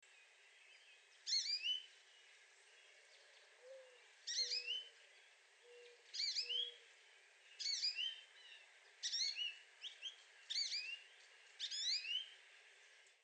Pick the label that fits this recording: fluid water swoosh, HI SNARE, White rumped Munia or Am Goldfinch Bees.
Am Goldfinch Bees